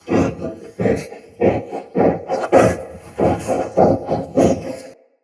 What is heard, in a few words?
Movement Breath Loop Run, Animals; Bromius